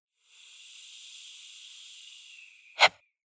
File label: notification